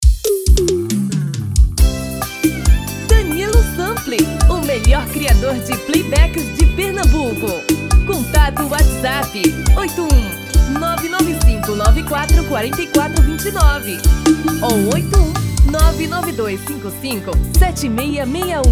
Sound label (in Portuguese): Vers Arrocha